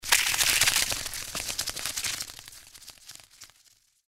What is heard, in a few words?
hit sfx; npc; cazadore